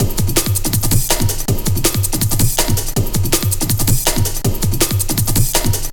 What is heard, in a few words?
162, breaks, Loops; m8 breaks